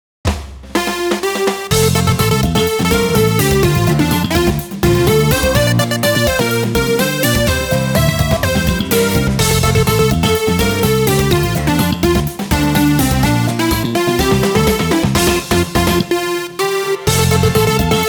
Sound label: Summer Weather, bgm